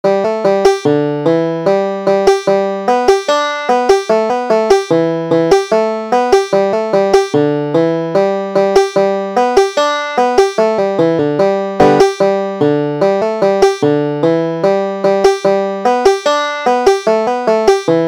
kellys goat, banjo

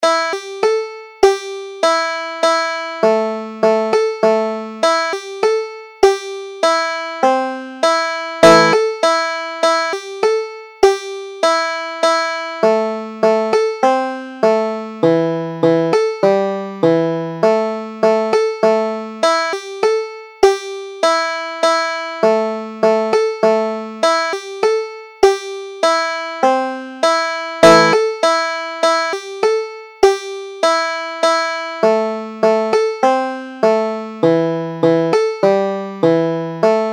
breakdown, banjo

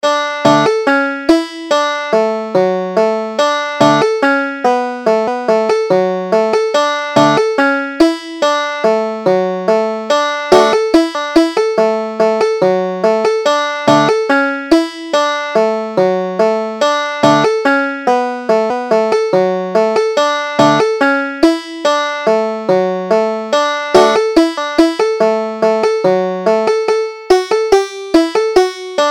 old pennsylvania dance tune, banjo

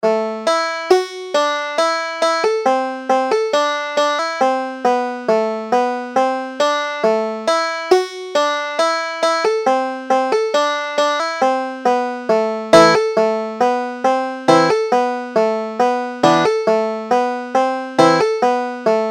sing we now noel, banjo

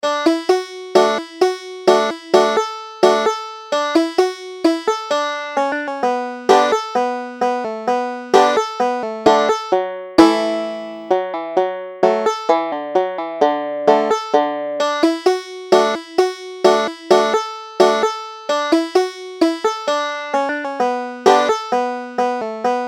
slide, banjo

